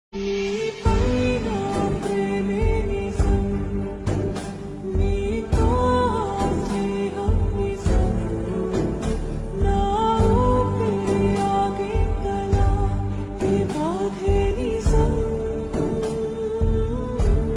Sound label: Slow Reverb Version Ringtone, telugu; Love Reddy